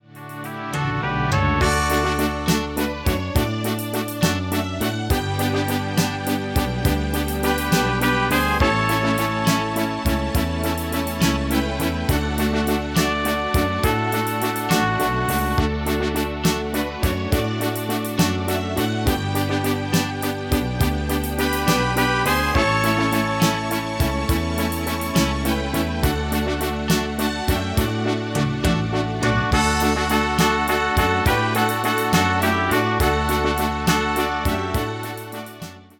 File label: MIDI